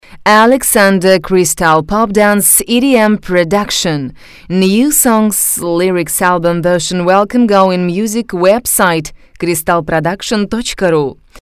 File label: Promo Jingle